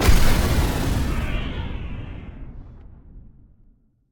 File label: explosion Core; explosions; Mindustry